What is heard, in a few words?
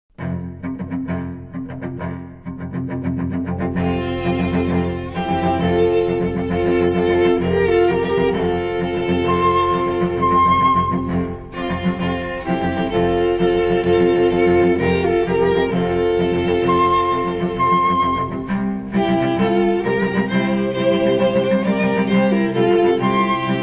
quartet; CHRISTMAS; QUARTET ARRANGEMENT